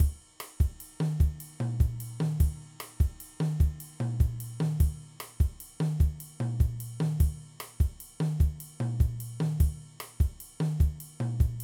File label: Afro Cuban